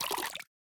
Spill Liquid; boomerang fu

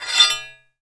FRICTION Metal Bars; don t be afraid the first toy

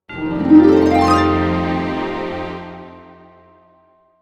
Get Statue, Jingle; Balan Wonderworld

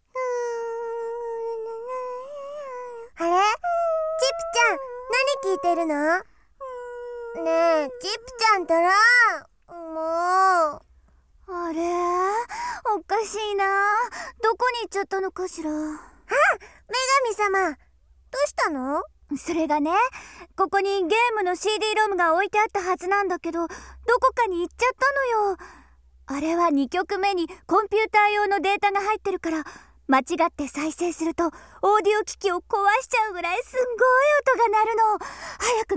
Warning; Chip-chan Kick; TurboGrafx-16

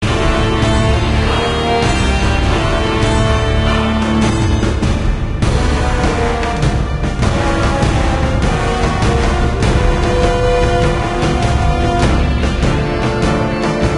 Sting Norse Battle; Age of Empires Mythologies; NDS

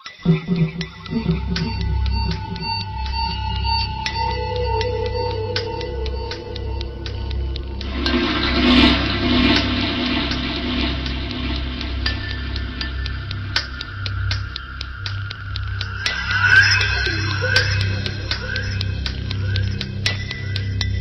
Farm Background; Pixel Gun 3D

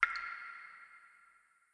drip

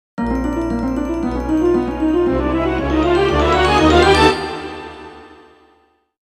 Open a Treasure Chest

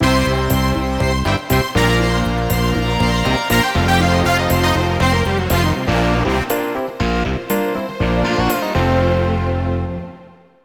Jingle Victory

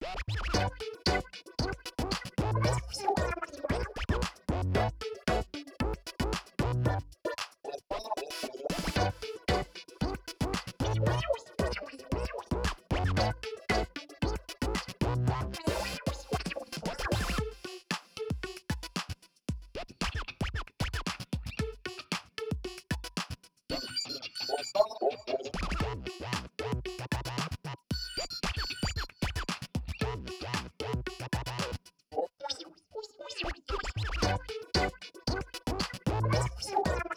BGM Plaza Shops; Seagrapes

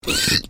Oink; bad piggies; android; mobile; windows

